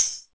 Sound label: tambour, tambo shaker